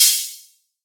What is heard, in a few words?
quiet open hi hat sound d sharp key, Royality free open hi hat tuned to the D# note